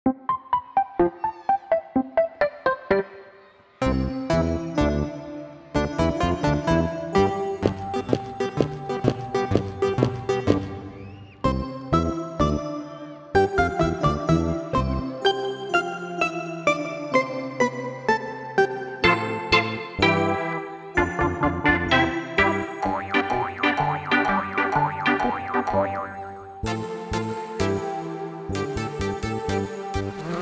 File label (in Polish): wersja instrumentalna; EduMuzArte